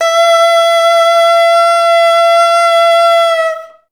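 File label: oboe E4; Best Service; Peter Siedlaczek's Advanced Orchestra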